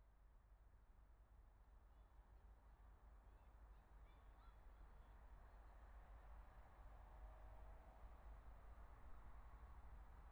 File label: Trains